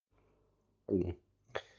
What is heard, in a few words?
gulp